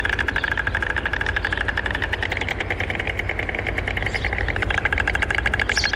white stork call

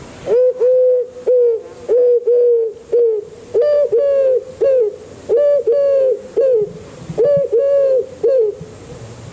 collared dove call

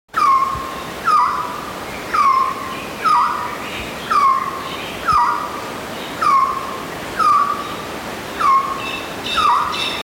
resplendent quetzal call

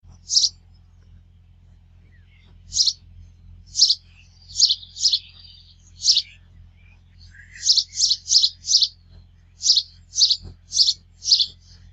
house sparrow song

